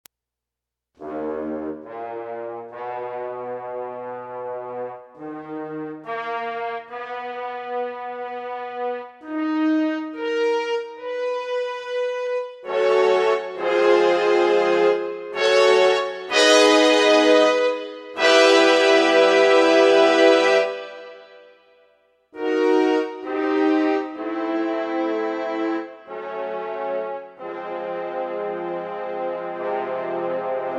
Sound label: french horn, fm synth